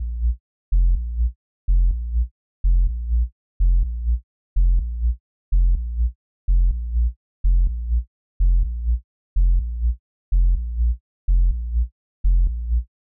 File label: Bass; Remix Pack